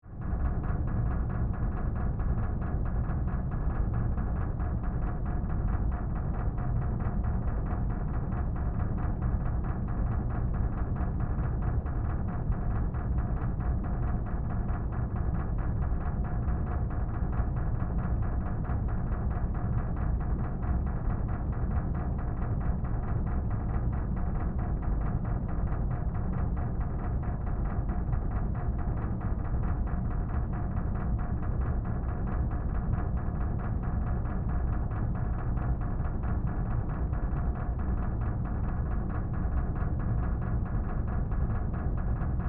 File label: Ventilation Shaft, Industry, Ventilation, Shaft, Interior, Metal, Rattle; Machinery Sound Effects; BlastWave